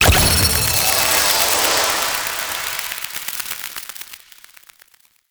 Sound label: Activate Burst; Apothic; Warframe; Ambience; Grineer Forest; Titania Quest